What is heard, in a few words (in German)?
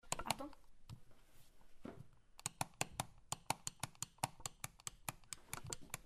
lichtschalter